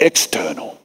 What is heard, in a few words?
Vocal Phrases; Vengeance Samples; Vocals